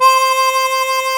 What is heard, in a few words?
HARMONICA